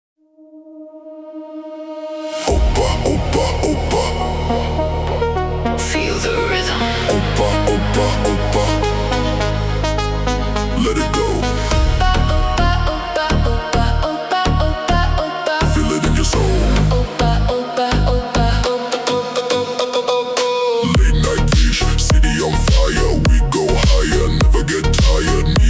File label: Car Bass Music